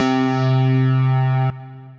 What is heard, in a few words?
Wah Lead, synth lead; Ramsel Wah Lead; Ableton; Factory Packs; Singularities